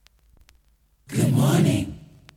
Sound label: jinglepackage; topformat LP jinglepackage 2